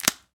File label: Glowstick On